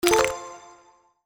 success

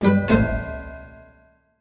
fail, solitaire

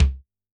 kick, jazz

